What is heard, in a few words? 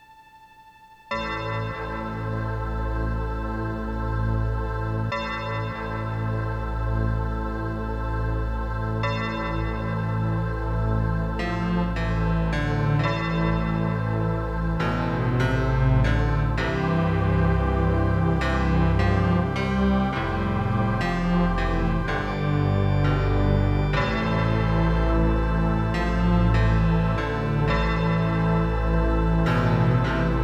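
Orchid NuSound PnP 32, General MIDI; 44 kHz stereo FLAC recording; Speaker out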